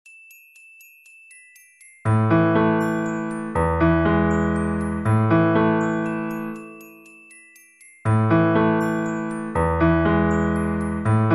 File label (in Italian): glockenspiel; per elisa